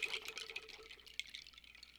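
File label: Music Village Calm Near; From Dust; PS3; Xbox 360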